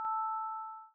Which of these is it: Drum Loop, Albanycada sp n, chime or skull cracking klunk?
chime